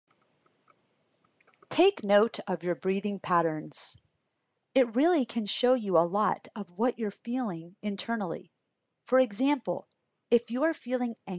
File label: Breathing